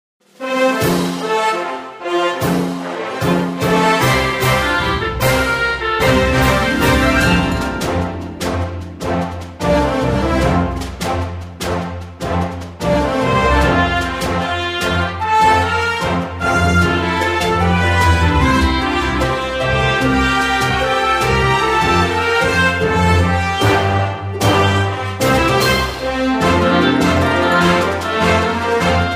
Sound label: Battle BGM